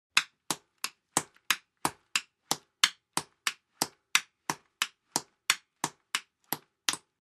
Dancing Vari Steps

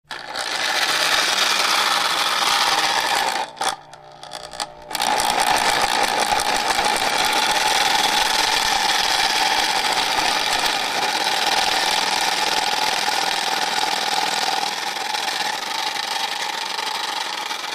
Hair Dryer Broken On, variable speed

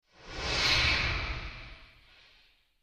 Distant Steam Releas

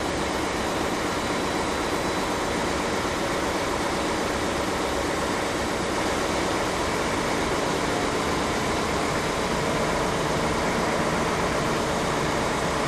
Boat Engine Constant

